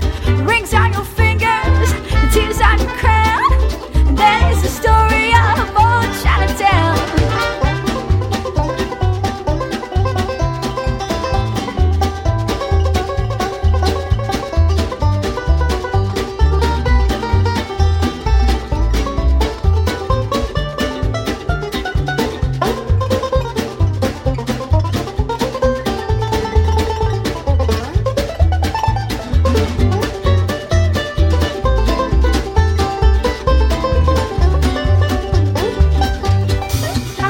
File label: Swing Grass, banjo